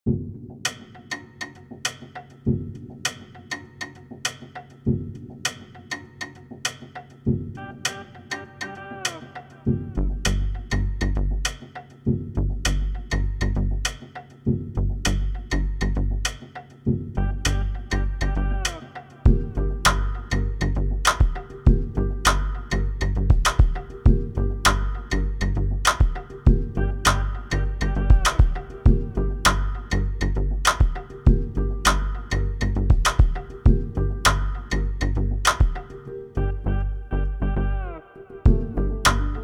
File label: HipHop